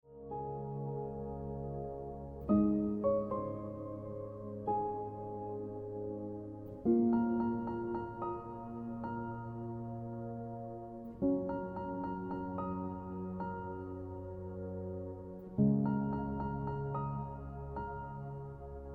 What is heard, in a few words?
Celestial Ringtone